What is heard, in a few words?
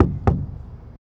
Park Playground Slide Perc; Moody; Houston